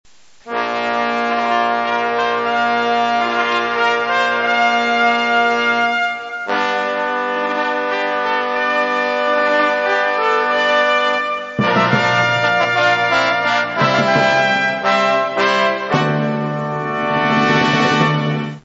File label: ensemble